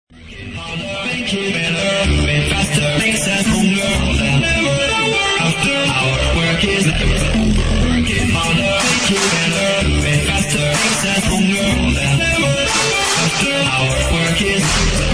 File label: dubstep remix